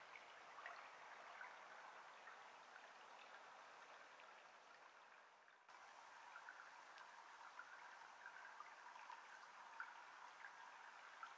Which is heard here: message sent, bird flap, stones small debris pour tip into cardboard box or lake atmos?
lake atmos